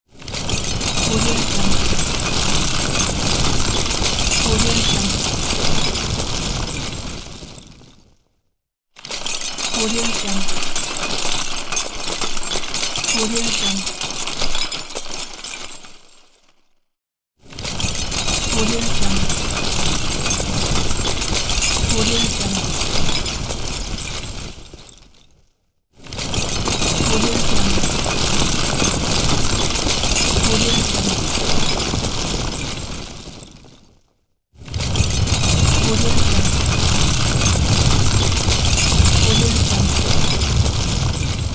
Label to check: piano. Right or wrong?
Wrong